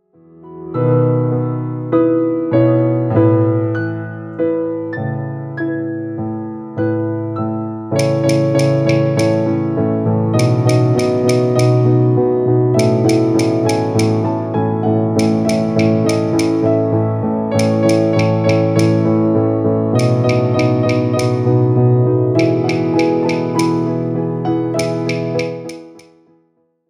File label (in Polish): sensoryczny spacer